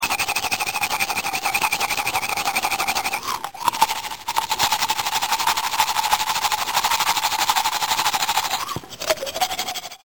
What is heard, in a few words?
Toothbrushing